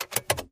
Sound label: ignition turn, keys